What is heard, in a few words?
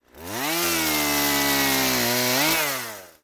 chainsaw cut